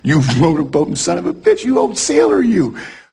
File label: motorboatin